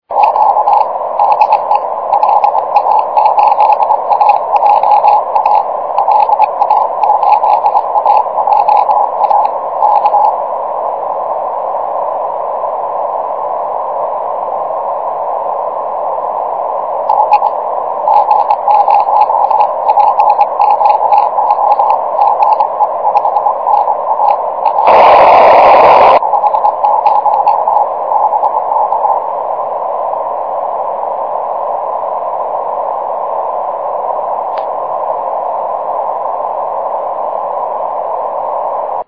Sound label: aurora